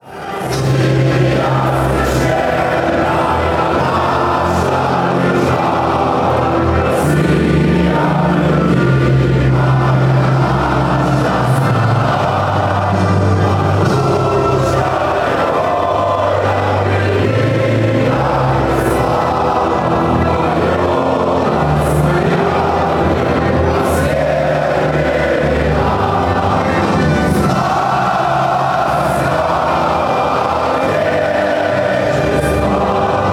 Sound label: russia national anthem, fanchants